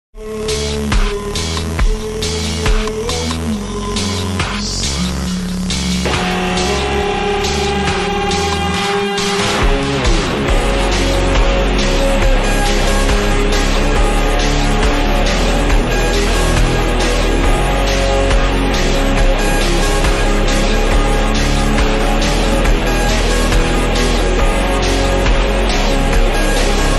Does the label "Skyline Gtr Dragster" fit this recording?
No